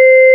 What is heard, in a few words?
VIBES C5; Big Fish Audio; Synth City